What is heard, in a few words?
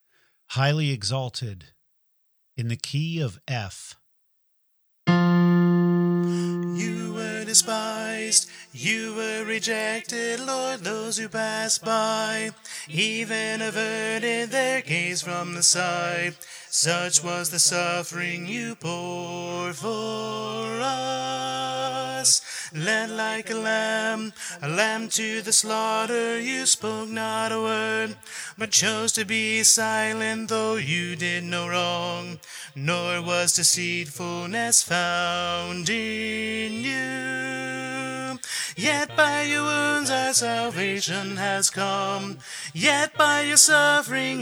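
Highly Exalted Tenor, choir part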